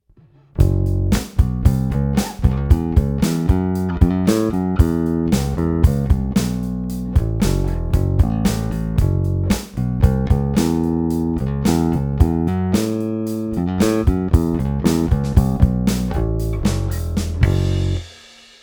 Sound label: Basstest